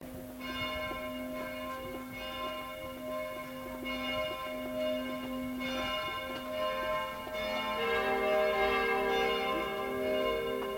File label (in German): glockengeläut